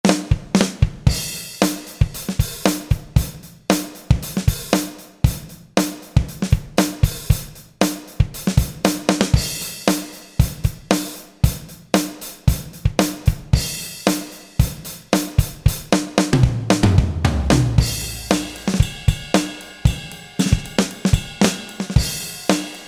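Standard Rock 1 KIT, Roland SRX-01 Dynamic Drum Kits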